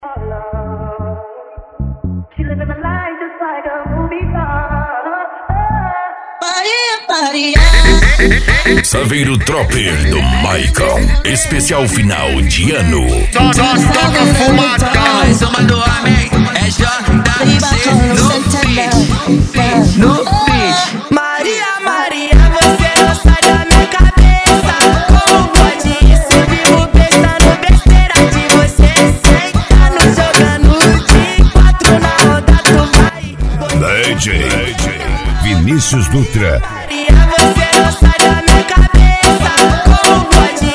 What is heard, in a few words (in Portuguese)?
funk agressivo funk